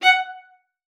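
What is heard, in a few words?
F#5, viola spiccato; Xsample VOL-5; 90 s Sample CDs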